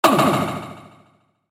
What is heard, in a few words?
cowboy death